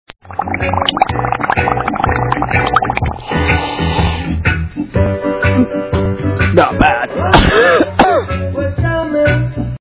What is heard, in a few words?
bong